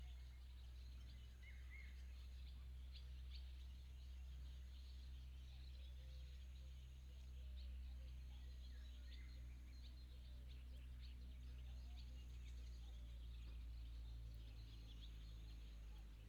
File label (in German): Motorboot; Lexikon-Roman